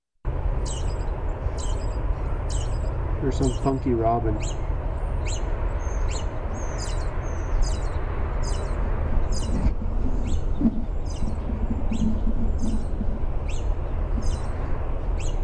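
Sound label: American Robin whisper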